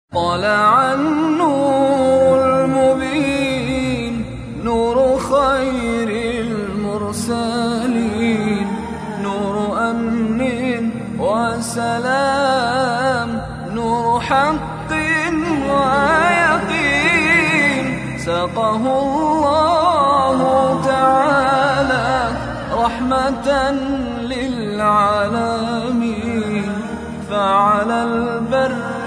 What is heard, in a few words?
Arabic Ringtones; Islamic